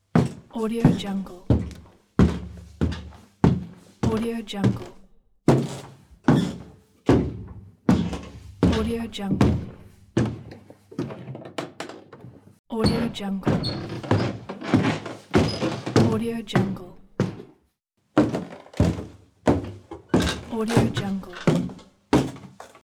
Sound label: wooden staircase